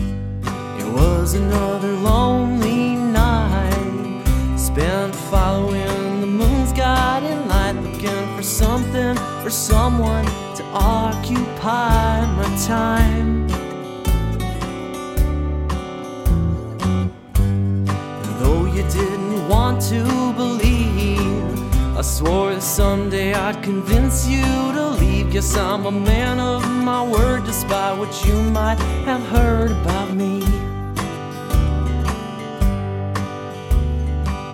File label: Country, stem; musdb18; Music Delta